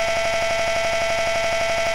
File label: Wrong Move